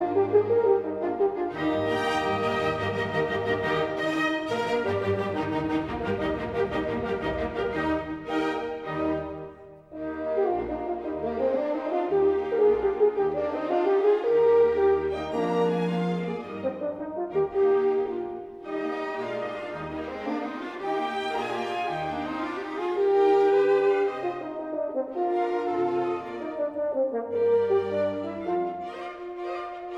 Allegro hoornconcert; Mozart; kv 447

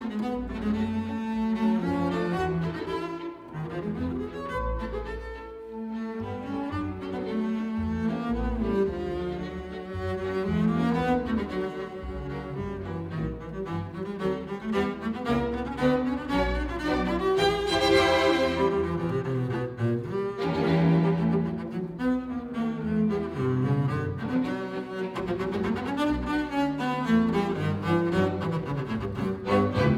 Concert voor contrabas en strijkers

